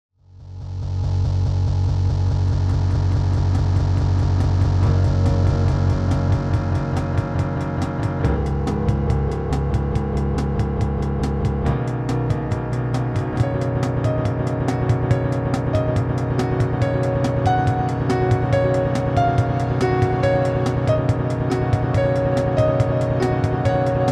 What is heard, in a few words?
good drums; PRACTICE JAMS